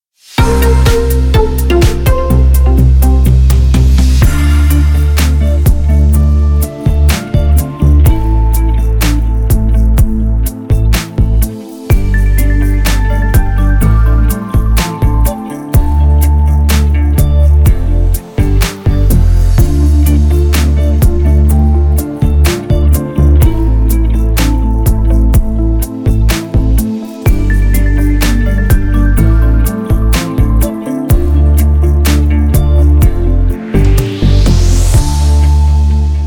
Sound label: Rainy